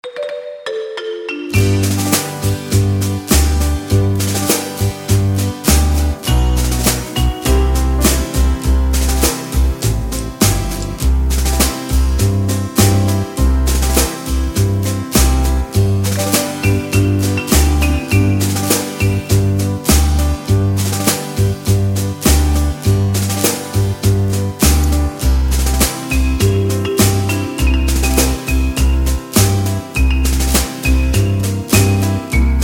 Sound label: (C) No Backing Vocals